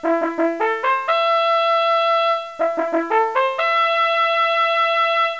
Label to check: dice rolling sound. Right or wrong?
Wrong